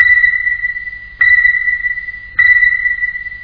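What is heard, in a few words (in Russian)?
многократный писк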